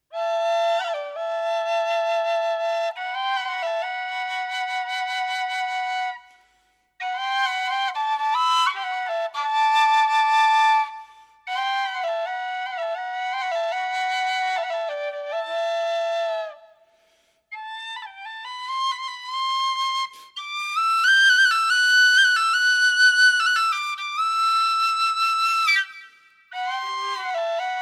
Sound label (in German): minne floeten